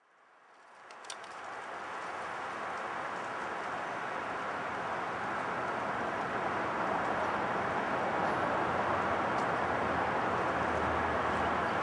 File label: Eastern Phoebe